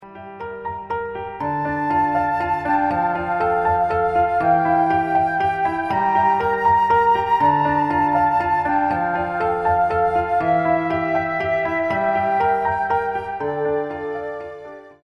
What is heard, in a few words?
Music